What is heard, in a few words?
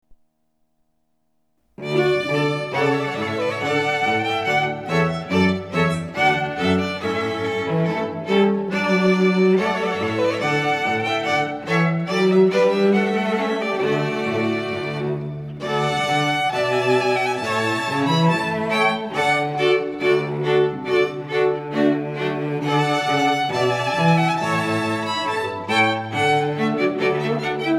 TRUMPET VOLUNTARY, string quartet